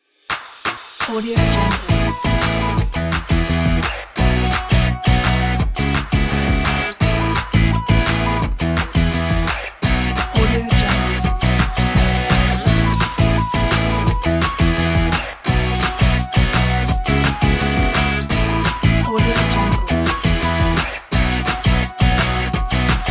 indie

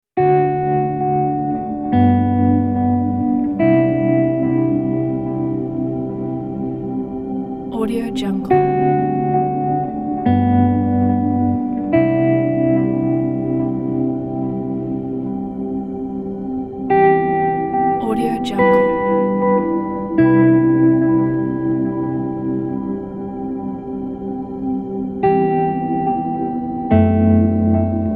ambient guitars pack

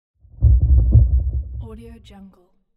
earthquake; musicocean

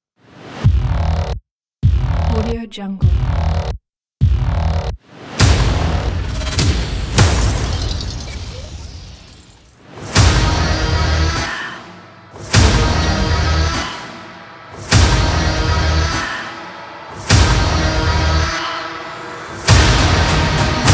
sound design trailer; karsroia

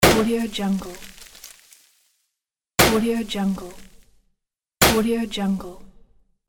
party popper

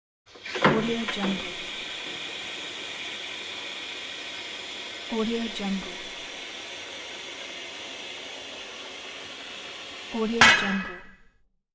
forklift maneuvers

